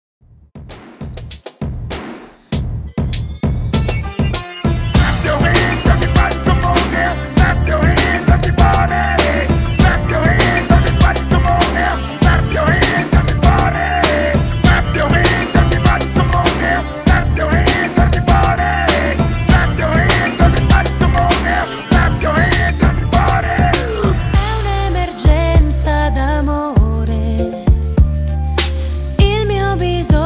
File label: 90S HYPE REDRUM 99 BPM CLEAN